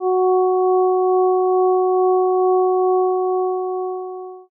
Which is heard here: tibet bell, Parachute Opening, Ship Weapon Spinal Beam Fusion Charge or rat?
tibet bell